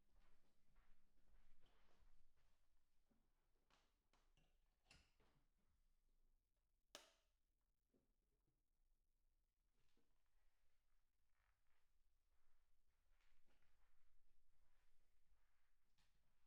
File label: PERC OH